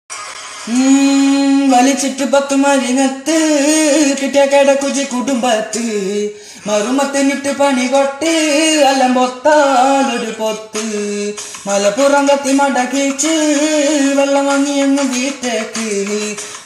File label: rap song